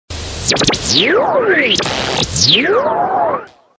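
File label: Electronic Drums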